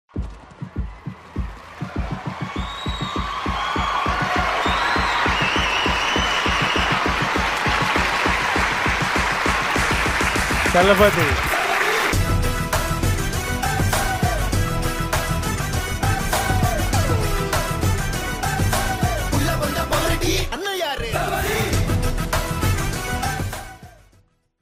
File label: intro bgm; thalapathy vijay